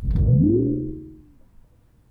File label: Woom, chairmat; Micro Pack